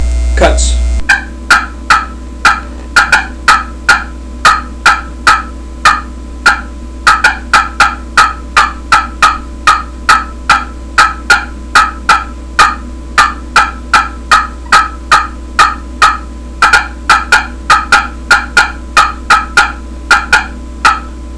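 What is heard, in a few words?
triple throat aluminum cutts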